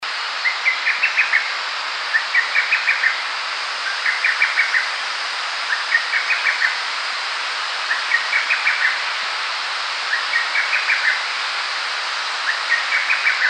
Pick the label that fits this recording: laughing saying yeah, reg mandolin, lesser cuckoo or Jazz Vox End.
lesser cuckoo